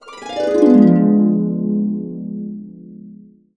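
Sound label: D diminished 7, harps; USB Soundscan vol.62 - Complete Harps; AKAI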